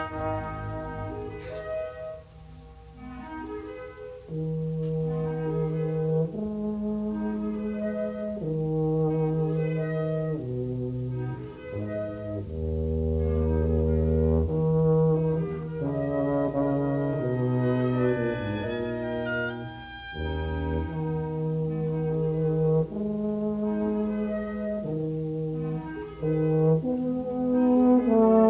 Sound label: Horn Concerto No. 1 in E-flat